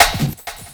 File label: Pickup, Drums & Percussion; Percussion Fills; FX; Sonic Foundry (Sony Creative Software); Futurist Drum & Bass